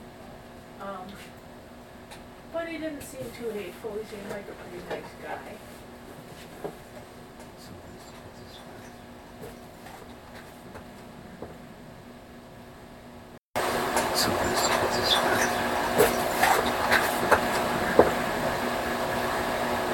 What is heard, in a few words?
caused a scratch